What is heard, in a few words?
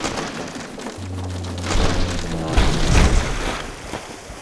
ship dismast; Sid Meiers Pirates; XBOX